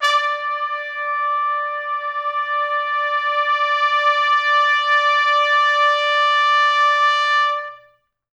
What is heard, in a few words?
D4 -R, Pop Brass; Best Service; ProSamples vol.19; AKAI